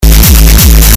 funny kick; japshitfun; lolicore

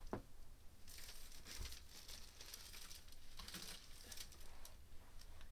Paper Crinkling; Working With My Grandma 4.29.2016

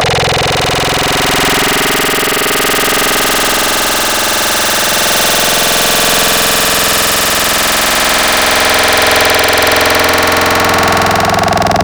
Screaming; Synthetic's Serum Collection; Cymatics - Wavetables Collection Vol 1

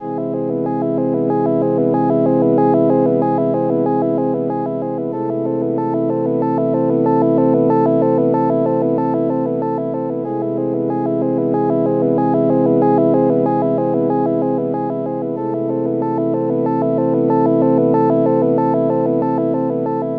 locked grooves; lolicore

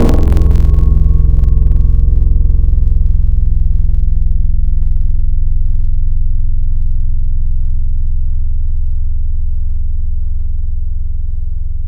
Hit, Tropical Mallets

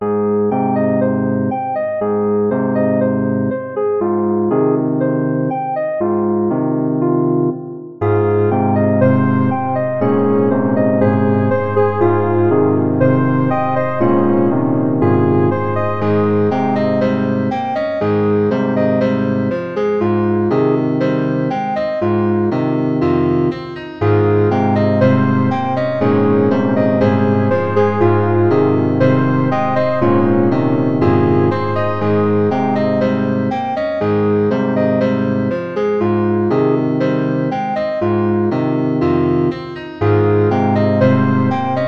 BGM, Relaxing Nighttime; Seifuku Sound

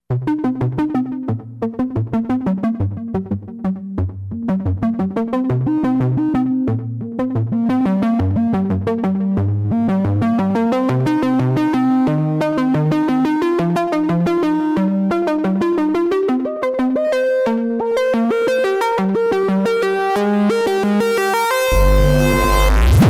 gabber song

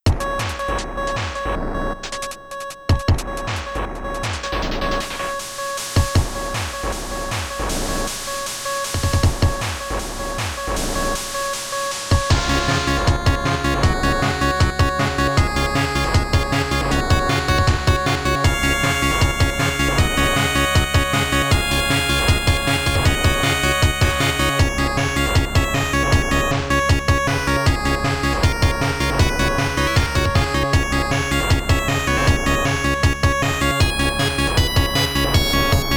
Internal Percussion; studio pixel; Mischievous Robot Beta

